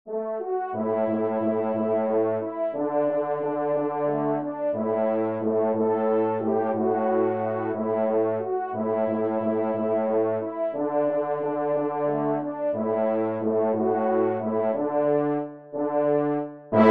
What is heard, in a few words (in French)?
TRP; Fete au village; Poule